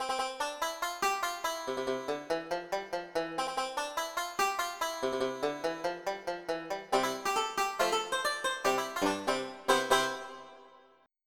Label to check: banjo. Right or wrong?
Right